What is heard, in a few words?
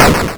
hit